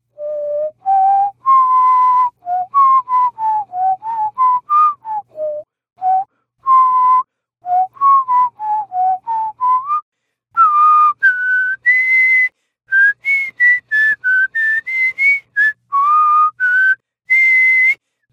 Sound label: Whistle Sample; Spanish voice over for bilingual and Latin American audiences